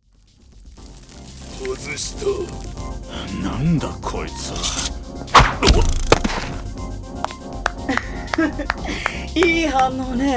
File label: viola